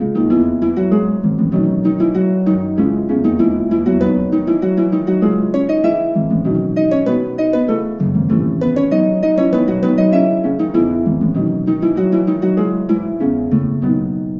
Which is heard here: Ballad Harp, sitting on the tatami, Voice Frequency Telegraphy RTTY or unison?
Ballad Harp